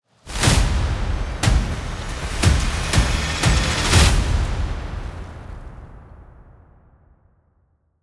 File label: Drum